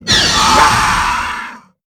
alien creature death scream shriek roar cry

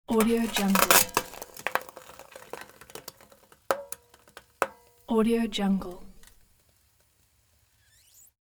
metallic sizzle in pan

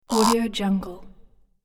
synthetic rubber moves

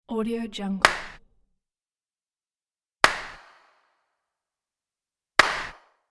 clap

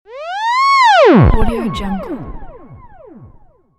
alarm; klassikua

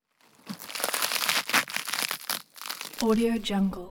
crunchy tear or rip

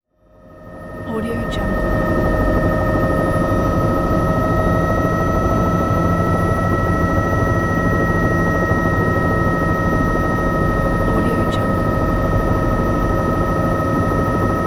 a small helicopter in flight ambience; sound-ideas

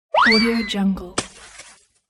snowball hit comedy

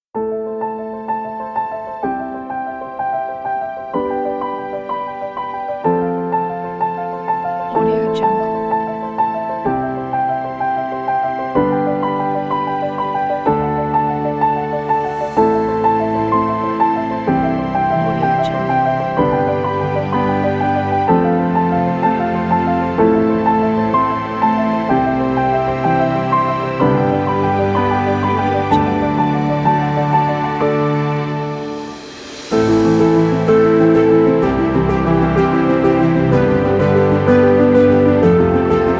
a classical piano

